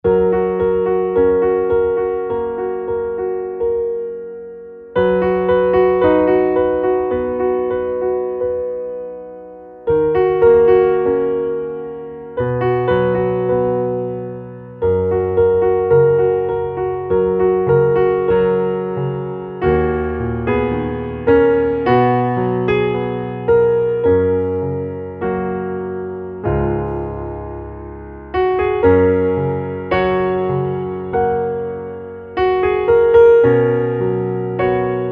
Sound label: Underscore PIANO; Worship Flow